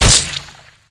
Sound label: splat